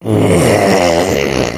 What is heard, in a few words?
death; doom3; morgue